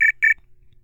ALERT

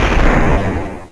bash it; duke nukem total meltdown

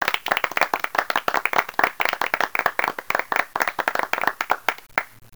APPLAU; Tempra; comdex

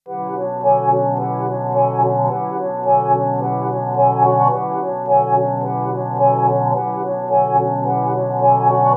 MELODY; Chillout; Future Music

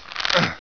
rope grab; hereticiidemo; player

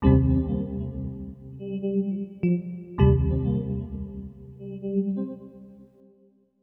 SYNTH D+B 160 C, Drum+Bass, Keys; eJay

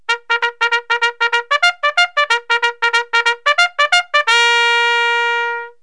Calvary Call, Music Bugles

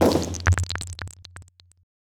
destroy group; frozen bubble

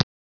die on die; BOXCARS

